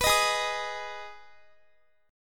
G sharp Minor G sharp down Guitar, chord; G#m strummed

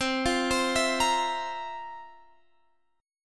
F Major 7th Suspended 4th C

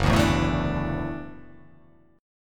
F sharp Minor 7th Sharp 5th F sharp; strummed; JGuitar

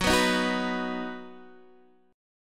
B-Major F sharp

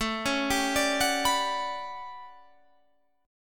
A 13th A down Guitar Half Step Down

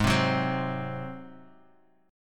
F sharp Suspended 2nd Flat 5th

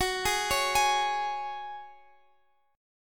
F sharp Suspended 2nd; arpeggiated; x,x,16,13,14,16

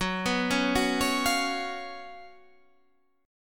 F sharp Major 7th Suspended 4th, Guitar Standard; F#M7sus4 arpeggiated

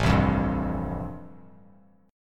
A-Minor 7th Sharp 5th, Bass Standard, chord; Am7#5 strummed; JGuitar

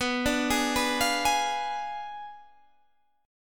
B Diminished 7th B down Guitar Standard; Bdim7 arpeggiated

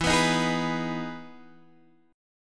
E Major 7th E, Guitar, Drop D; chord shape

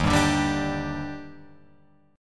Eb Major 7th, Guitar, Open F; EbM7; strummed; JGuitar

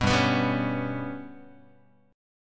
G Major Flat 5th G down Guitar; GMb5 strummed; JGuitar

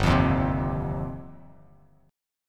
G 7th Suspended 4th Sharp 5th, Bass Standard; G7sus4#5 strummed

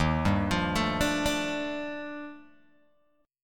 Eb Major Sharp 11th Eb Guitar Open G

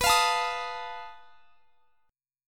B 7th Flat 5th B, chord; B7b5; strummed

